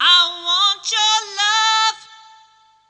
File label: Sing Voice I Want, Choir